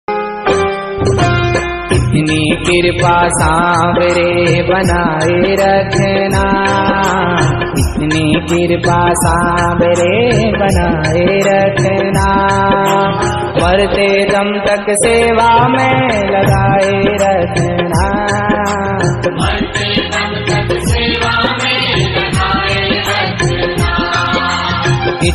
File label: ringtone